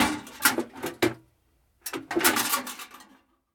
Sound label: DUSTPAN, DUSTING; 1000fx